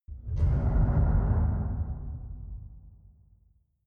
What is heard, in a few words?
deep metal impact, impacts; hits explosionen